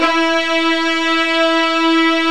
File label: SPARCO VIOLIN